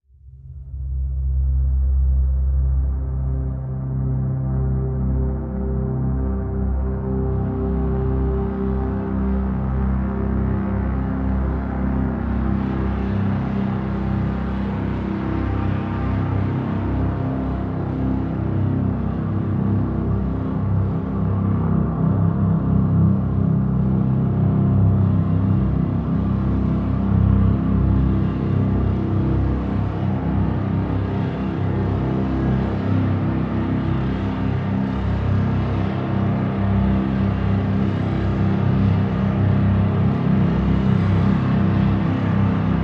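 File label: Drone Fahrenheit C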